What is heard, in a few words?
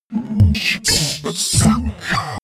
verbal noises